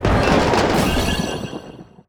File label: Dragon Resurrection